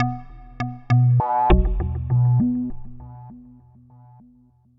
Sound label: Fall And Bounce Arp 100bpm